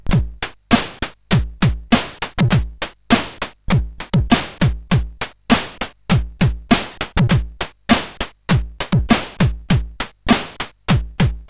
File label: Beat, CSG8580R5